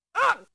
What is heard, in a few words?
punched; RedDot